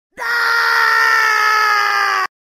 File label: bwaaaa; lapin cretin